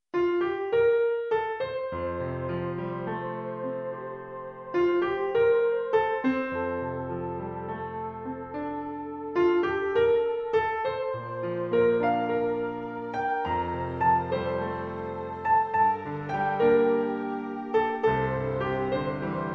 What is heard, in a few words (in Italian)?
canzone